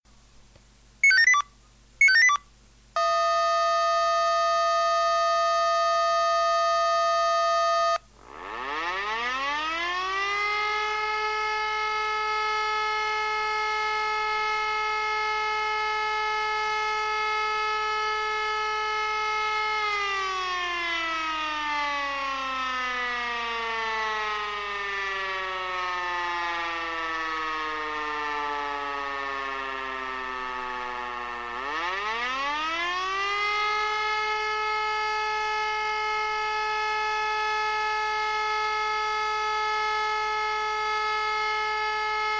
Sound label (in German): sirene, zivilschutzalarm